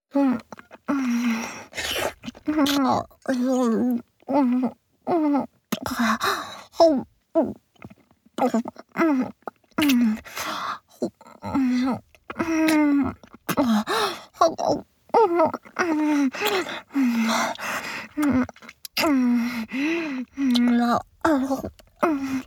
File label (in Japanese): チュパ音, ASMR; フェラチオ(中)：陰茎と玉袋も舐める